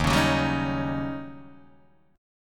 Eb 7th Sharp 9th, Eb, Guitar, Open D

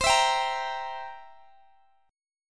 C 7th C down Guitar Open D

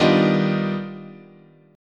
D sharp Augmented Major 9th, guitar chord

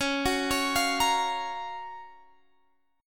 Gb Major 7th Db, guitar

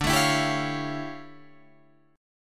D-Minor Major 11th, D, Guitar, Drop D

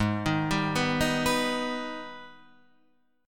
Ab Minor Major 7th Ab down; arpeggiated; JGuitar

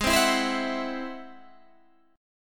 Ab Augmented 7th Ab Guitar Standard; strummed; Ab+7; JGuitar

